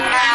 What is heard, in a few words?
alert